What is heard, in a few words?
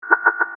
Scanner Beam By, Scifi